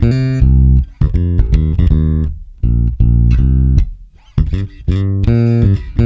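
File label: shuffle C, bass; Spectrasonics; Bass Legends; SHUFFIT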